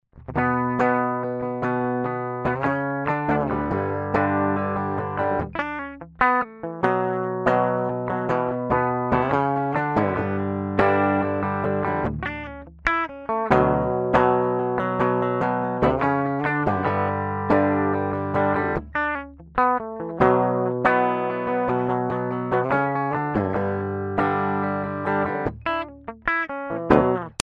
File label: 80s heavy